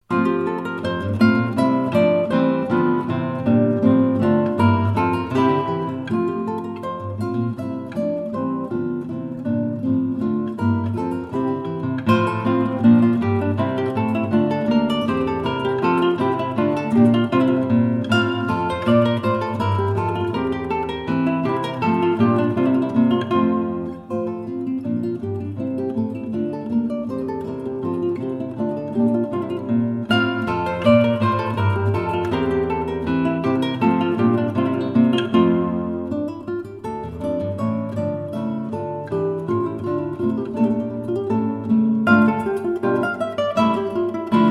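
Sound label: ensemble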